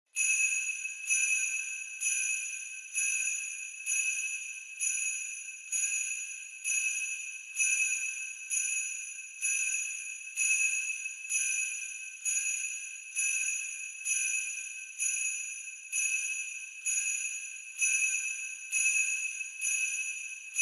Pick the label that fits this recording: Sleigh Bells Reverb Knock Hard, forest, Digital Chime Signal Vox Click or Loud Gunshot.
Sleigh Bells Reverb Knock Hard